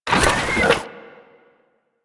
Shock Rifle Empty Fire

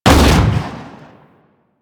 Fire Shot, Weapons; Strun

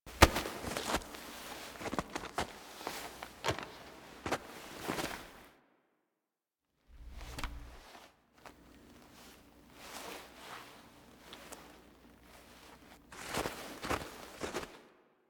End Foley; The War Within

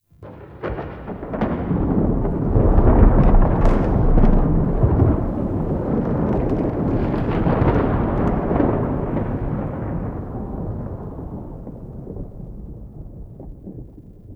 Murmur Exterior Rolling Thunder E; Warframe; Ambience; Duviri

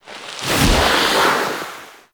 Slides Off Ledge Foley; Warframe; The New War; Throne Room

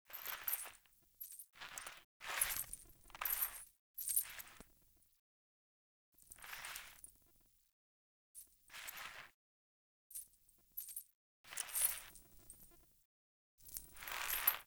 Foley; Friendly; Verminia; Bromius Quest

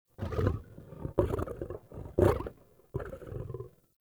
Wolf Growl Run; Animals

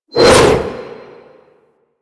Arch Wing Sword Whoosh; Warframe; Weapons

